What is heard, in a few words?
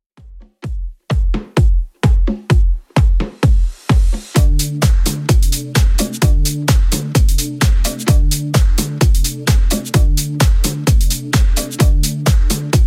Bootleg Mashup 129bpm CLEAN